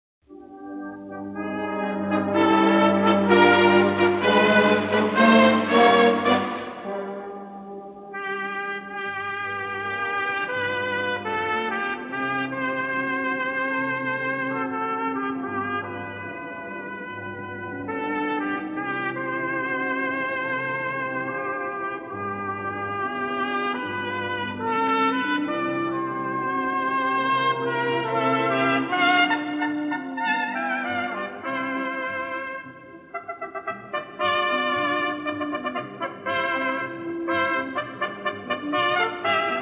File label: CORNET DUET; THE CLEANSING STREAM